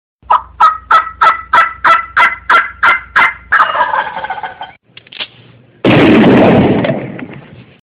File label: Funny Turkey